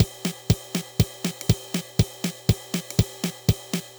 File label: Loop, dd-5